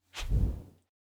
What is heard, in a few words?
Whip Whoosh With Hit; Hit Whooshes